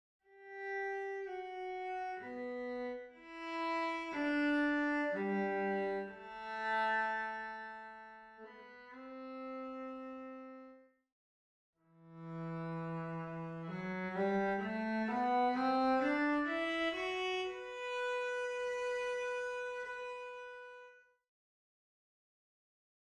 Cello; The Swan

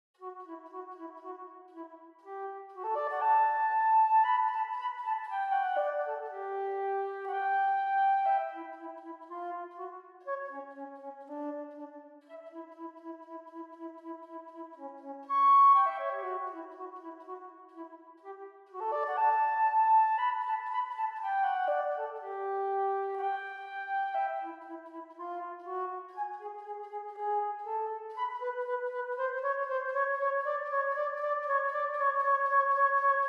Dance of the Reed Pipes; Nutcracker Suite

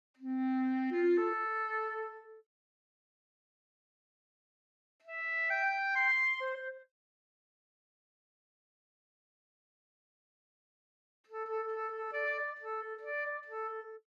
Clarinet; Gnome forest

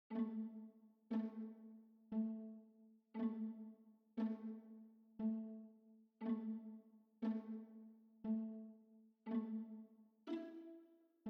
Violas; Nutcracker Suite

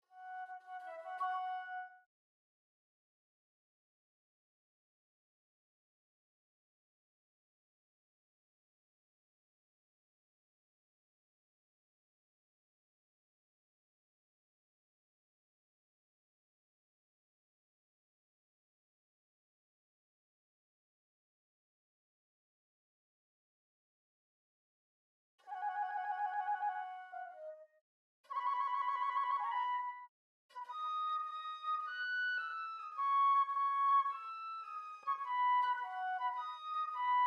Flute

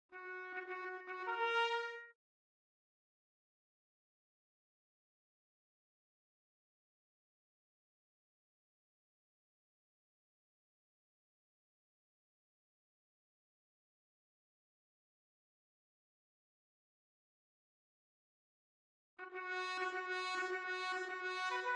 Trumpet

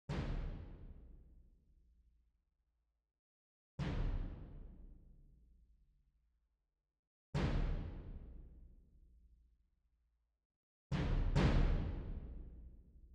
Bass drum; Overture or Something

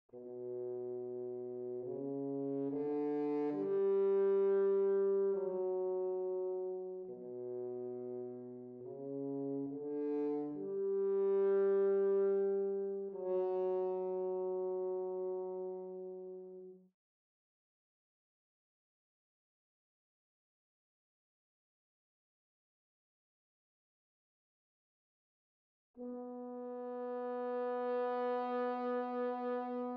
Horn

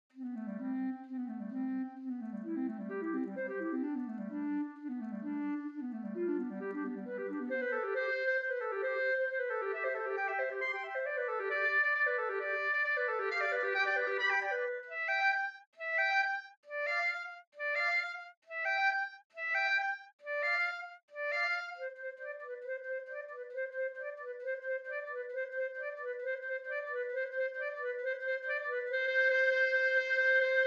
Clarinet; Overture or Something

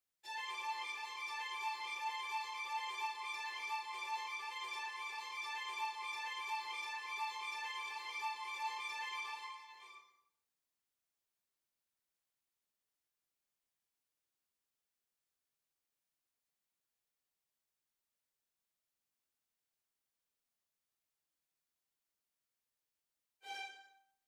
Violins

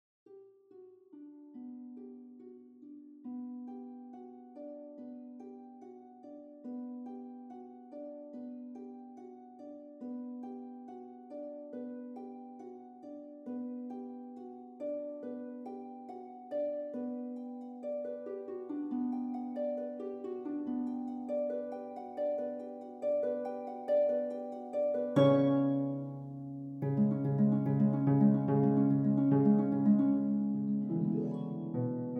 Harp

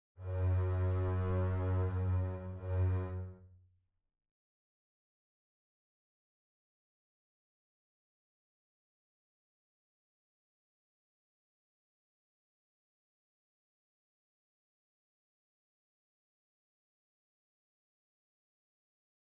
Double Basses

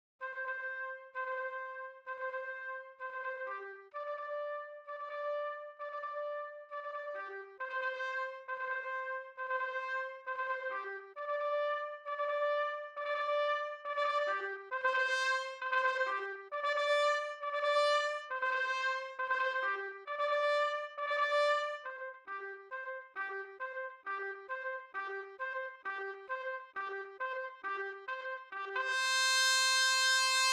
Trumpet; Overture or Something

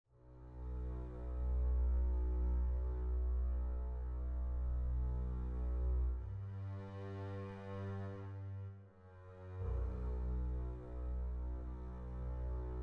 Double Basses

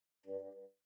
Bassoon

